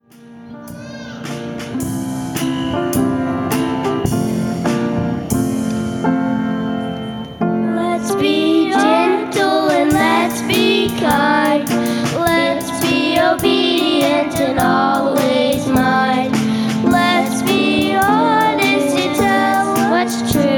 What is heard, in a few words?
children choir